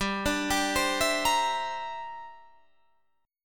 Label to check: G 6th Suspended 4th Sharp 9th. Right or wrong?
Right